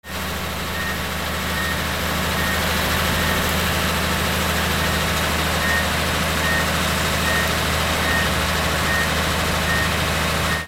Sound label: VEHICLES